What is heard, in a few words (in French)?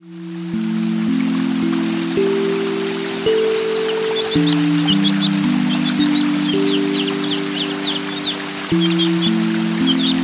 kalimba